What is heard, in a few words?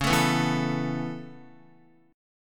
Db Minor 7th Sharp 5th, Guitar, Dbm7#5 chord; strummed; JGuitar